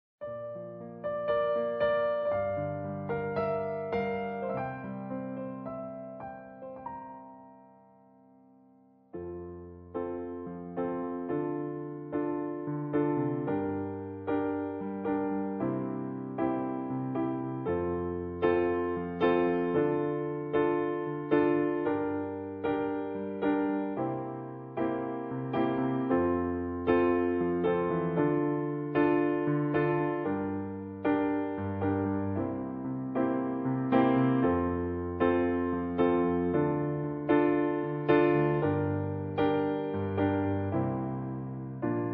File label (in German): Playback F-Dur